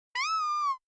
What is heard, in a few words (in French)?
Cri Nounourson